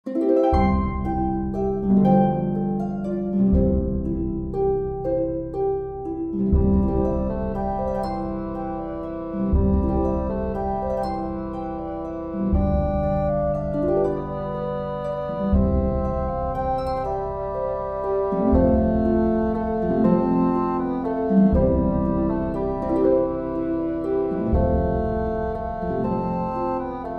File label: Mini Bassoon Track with Melody; Silent Night